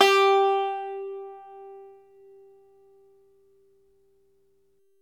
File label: ARCHTOP, Arch Top Banjo